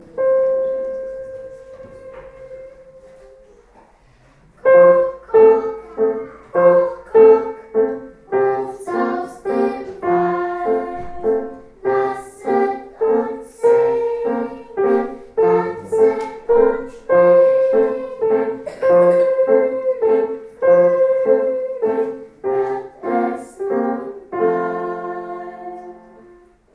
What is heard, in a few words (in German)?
Kuckuck